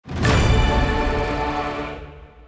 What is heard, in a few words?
box open rare